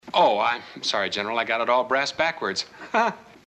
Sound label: brass backwards